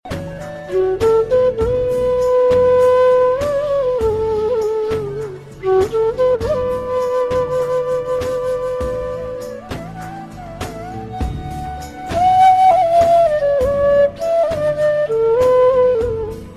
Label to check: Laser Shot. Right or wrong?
Wrong